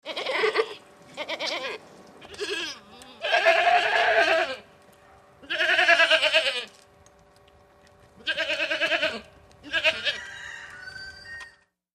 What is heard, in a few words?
Group Goats Outdoors